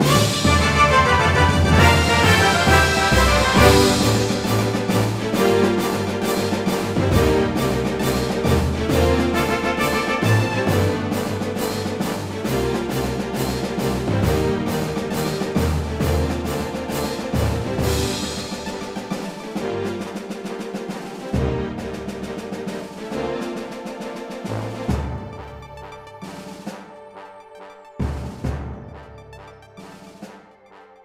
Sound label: Leave Port Fanfare long; 3ds; Steel Diver Sub Wars